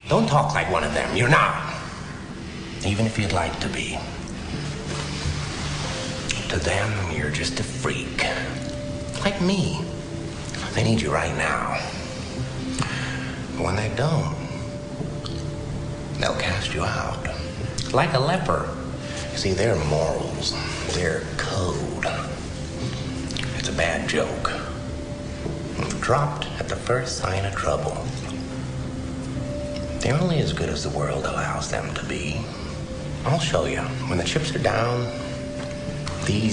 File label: Hip hop heavy metal